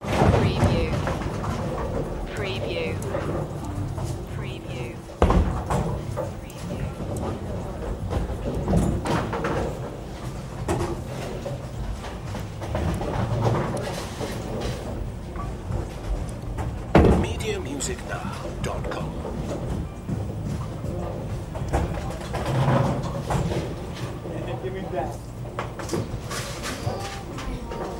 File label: Bowling Alley